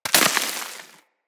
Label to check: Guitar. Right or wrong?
Wrong